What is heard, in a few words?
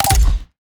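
fire boom, attack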